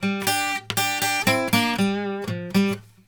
HI RUM LICK, Blues Guitar; ILIO; Fretworks

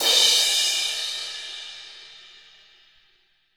crash 2,drums; KITS-LARGE

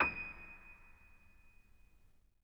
Acoustic Piano

